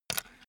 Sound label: pen put down